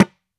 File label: dhi, mrid